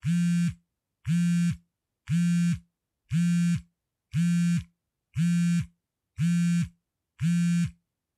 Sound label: mobile phone vibrate loop